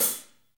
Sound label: HH, Kits, Rev